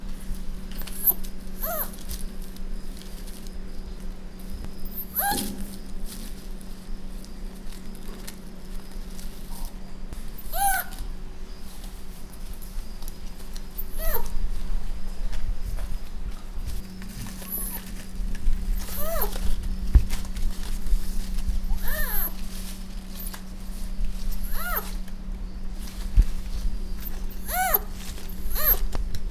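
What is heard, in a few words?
Castor fiber juvenile; A tame pup attending for feeding walking, tries to gnaw the tree branches and is calling softly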